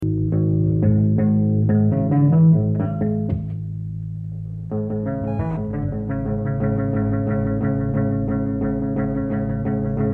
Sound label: Improvisation